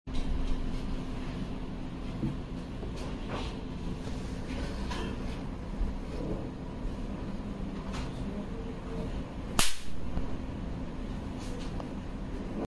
electrical connection, اتصالی برق

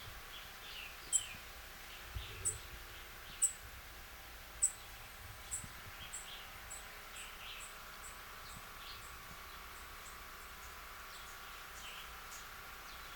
Cuban grassquit

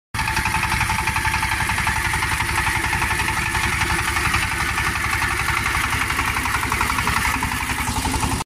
Agricultural tiller

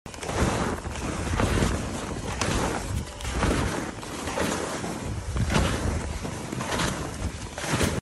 walking in snow; راه رفتن در برف

